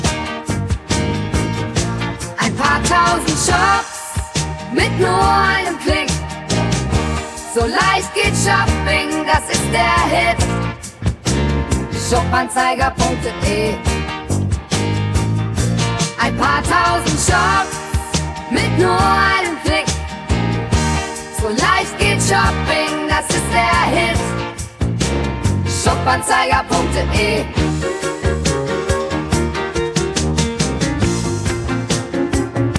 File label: Rock n Roll